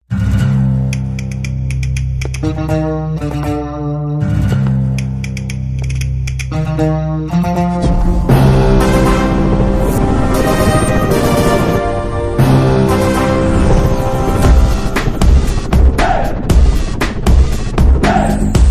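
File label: Teaser background music Telugu Ringtone; Maharshi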